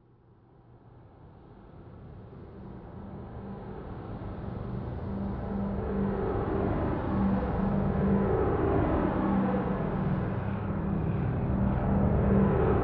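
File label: Ambient Vehicles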